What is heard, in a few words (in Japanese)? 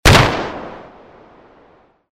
ハンドガン モノラル